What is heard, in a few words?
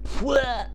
death; gusanos promode